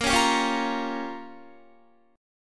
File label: A sharp Minor 6th A sharp down Guitar, chord; A#m6; strummed